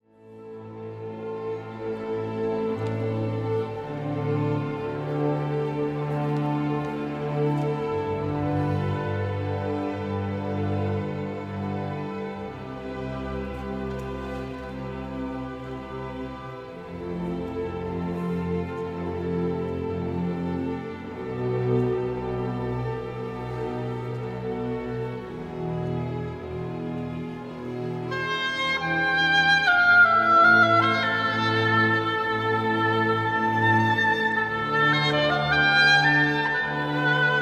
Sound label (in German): Ricercare fuer Oboe und Streichorchester